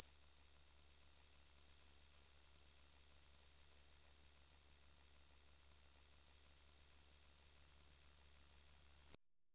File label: in a thick deep forest